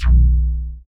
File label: WET BASS; Masterbits; Dance Trax